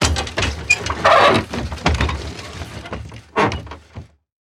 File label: Impact Wood Squeaks With Impacts